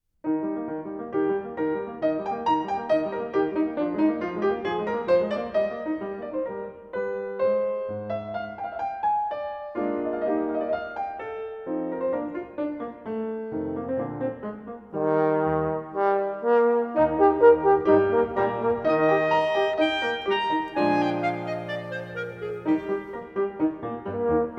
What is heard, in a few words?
Grand Trio Es dur Allegro; Fr.Lessel